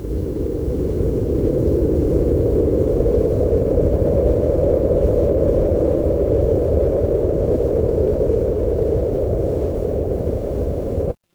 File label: ambience wind